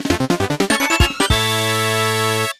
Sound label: Jingle Victory; game builder garage 2021 switch